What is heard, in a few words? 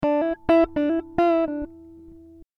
Em 100 GTR Riffs